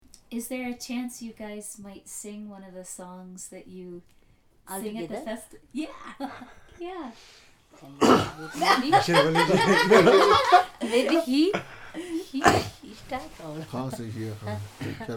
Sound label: Singing